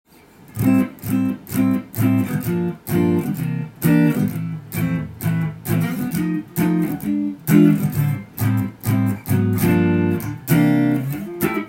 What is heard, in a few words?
guitar